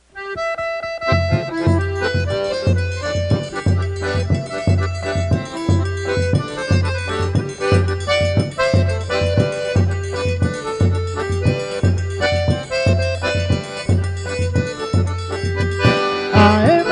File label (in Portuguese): faixa, acordeon